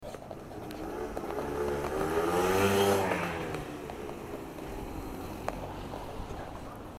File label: motorbike